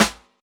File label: Snares